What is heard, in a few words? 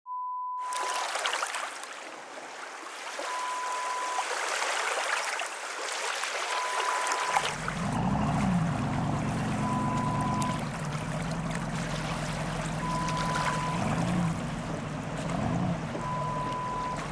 Lake Ambience